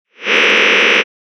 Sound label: cluster closed quarter D